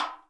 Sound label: REPINIQUE, 3-D Percussives, Ethnics; E-MU Producer Series Vol. 5 – 3-D Audio Collection